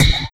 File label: Trap Kick; Sonic Foundry (Sony Creative Software); Esoterik Beatz; Trap Kit